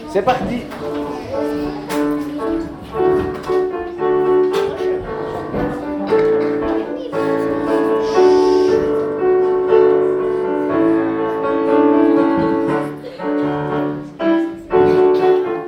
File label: enfants; chants